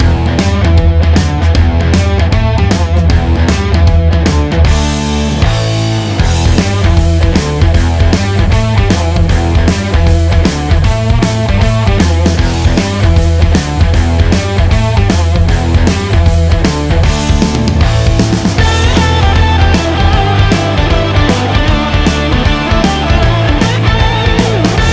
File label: Energetic Shuffle Rock; Meow Motors